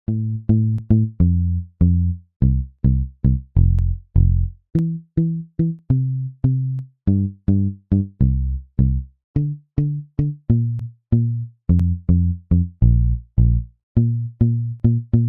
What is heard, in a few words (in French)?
musique, beat